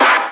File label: snares; Vengeance Samples